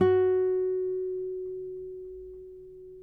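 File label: nylon, FLAMENCO, Guitars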